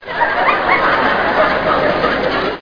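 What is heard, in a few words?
large laugh; Sound Library 2000; Wayzata Technology; People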